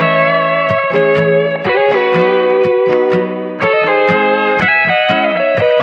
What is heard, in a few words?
guitar, Loops